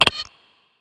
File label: gambler mouseclick; Magic Stars 5; Wazdan